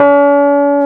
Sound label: KEY RHODS, Pop Pianos, Rhodes; Roland L-CD701; Pop Pianos 3